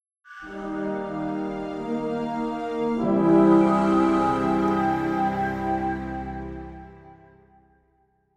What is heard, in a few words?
Stinger Discovery; Dishonored